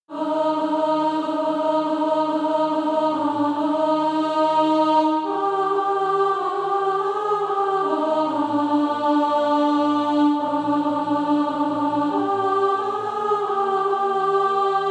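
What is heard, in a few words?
alto; Fly to Thy Refuge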